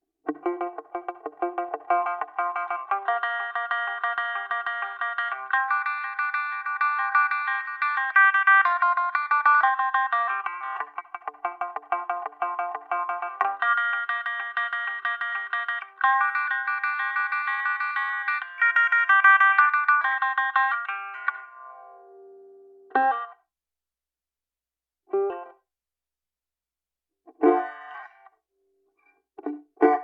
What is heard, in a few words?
Banjo No Banjo